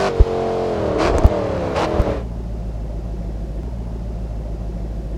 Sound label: throttle off, vehicles; 15gt